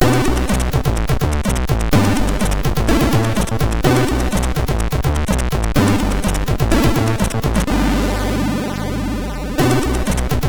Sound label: Get Ready Jingle, MOS6581R4